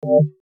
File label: hover